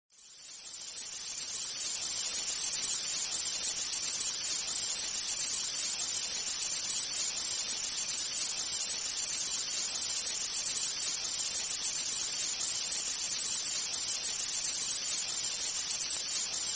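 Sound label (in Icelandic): suðandi tónn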